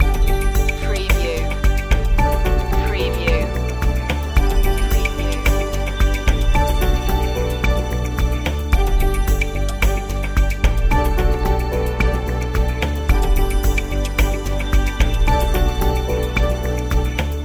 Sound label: footprints in the snow loop